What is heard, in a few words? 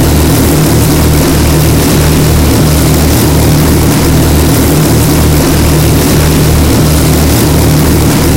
avro lancaster side, engines; War Thunder